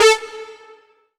lead syn, Techno Trance

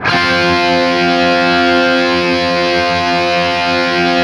5TH F looped, Guitar; East West; Steve Stevens Guitar Samples Collection

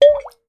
WATER; Xsample VOL-9

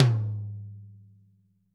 Toms; Bob Clearmountain Drums I

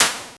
POLYMOD; AMG - Vince Clarke Lucky Bastard

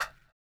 PERC; Spectrasonics; Metamorphosis; 3RD MAN

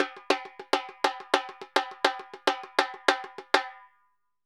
Repique 136; Best Service; Festa Latina VOL-1; Drums & Percussion

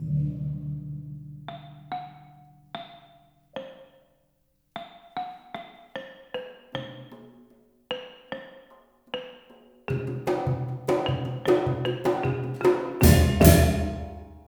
MALAY PHR L, Heart of Asia; Spectrasonics - Heart of Asia CD2

